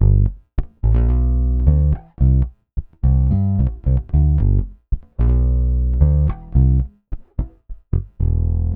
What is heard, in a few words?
Pop, Bass